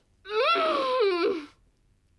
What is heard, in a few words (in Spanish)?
Grito Mujer